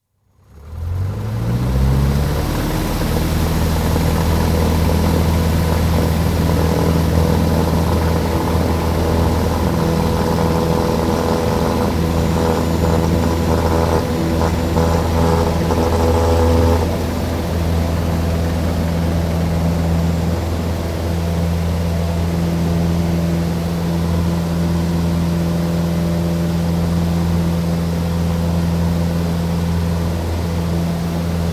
Cessna C150, interior, take-off, constant flight (Light aircraft, single piston engine); Airplane Sound Effects; BBC